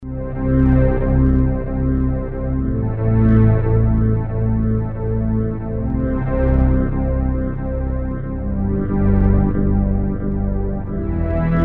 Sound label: pad murky, synth Roland alpha juno